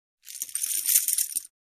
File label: Poker Chips